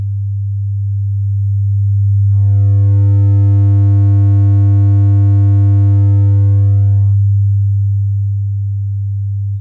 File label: Peak Clipping